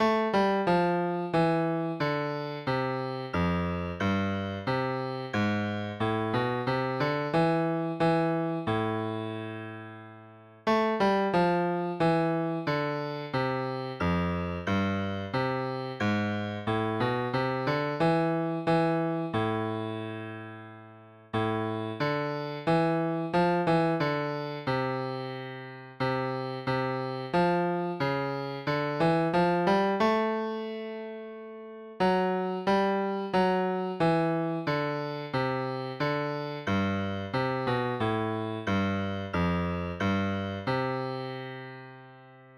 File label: Bass